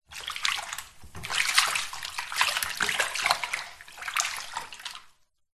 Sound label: household bath water very shallow movements room reverb; zapsplat